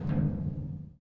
jump metallic; The Swapper

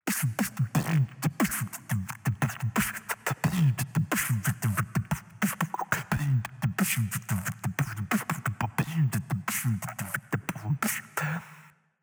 beatbox; CrossCode; battle; beatbox-penguin

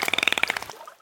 squak; World Of Goo; Fish

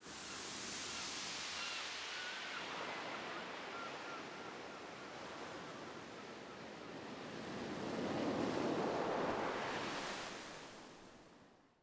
parallax ship

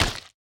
hit organic; CrossCode; battle

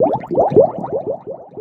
robot bubbles; boss

